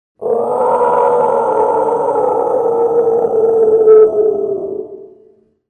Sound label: Monster Growl; Soundzabound Volume 3 Disc 3